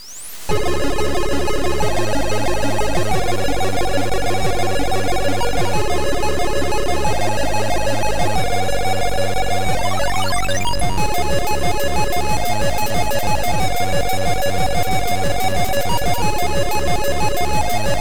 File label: Happy Hardcore